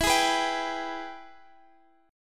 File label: Ab Minor Major 7th Sharp 5th